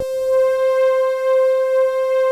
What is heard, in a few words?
RESONANT; Pro-Rec - Synthsonic; Z1 PADS